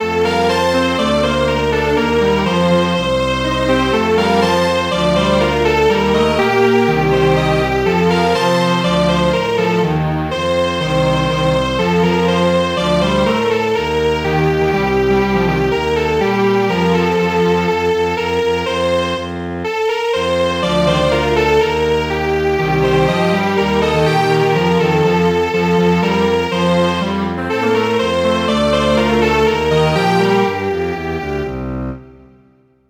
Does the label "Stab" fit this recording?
No